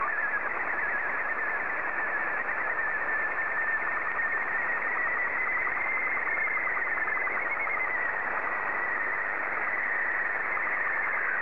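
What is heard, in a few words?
SSTV, Robot 12 BW; JA3YJQ; VK4MSL SSTV RX @ QG62ln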